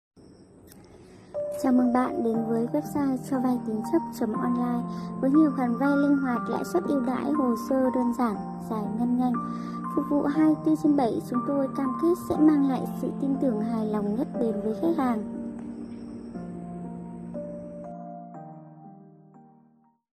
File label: music